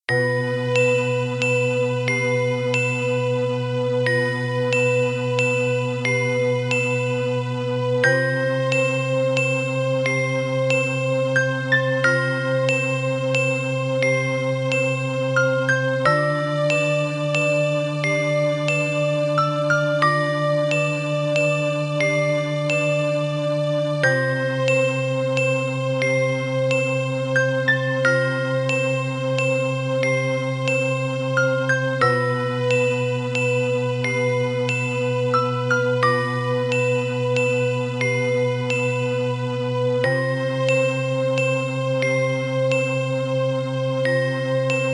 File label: game eerie